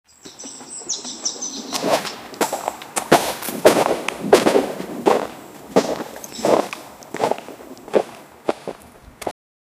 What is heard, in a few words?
hurried footsteps retreat